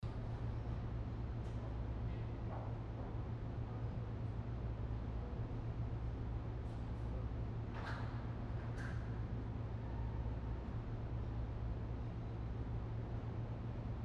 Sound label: Hardware Store Ambience; Class of '09 The Re-Up